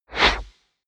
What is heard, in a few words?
wipe